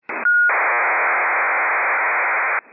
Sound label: burst